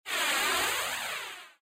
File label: combo burst; awawawawadesu